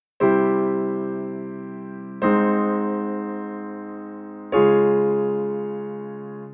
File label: Half step modulation